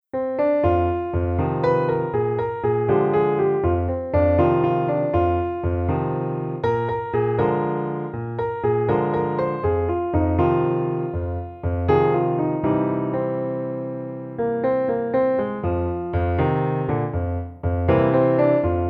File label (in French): Rockin' blues Piano seul